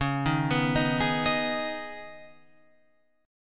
Db 7th Suspended 2nd Db, down, Guitar Open F; arpeggiated; JGuitar

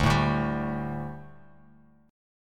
D Suspended 4th D, Bass Standard; strummed

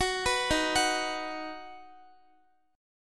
Eb Minor Sharp 5th Guitar; Ebm#5 arpeggiated

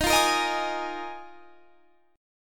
D sharp Suspended 2nd Suspended 4th D sharp; D#sus2sus4; strummed; 23,20,20,20,21,23

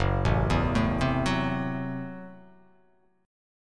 F sharp Minor 7th Flat 5th, guitar chord; arpeggiated; JGuitar

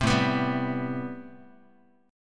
A Minor 9th Guitar; Am9 strummed; 3,5,2,5,x,x; 4A,4E,5A,5D,5G#,6C#

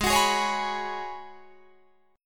G sharp Minor 11th G sharp Guitar Drop D, chord

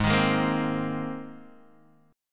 Ab Major Add 9th Ab down Guitar DADGAD; Abadd9 strummed; JGuitar

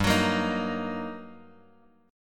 G Minor Major 7th Sharp 5th, down, Bass Standard; GmM7#5 strummed; JGuitar

